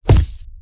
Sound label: Combat Punch Metal Armor